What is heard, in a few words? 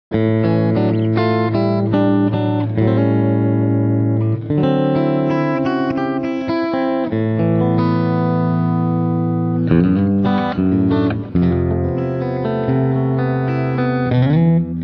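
Dualtone Clean, guitar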